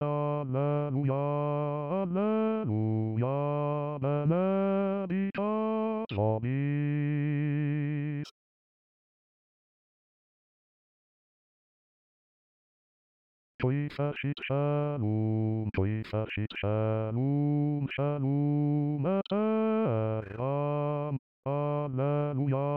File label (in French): basse